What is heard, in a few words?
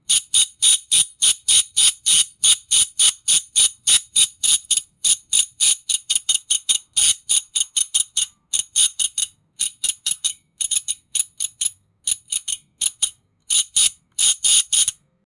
band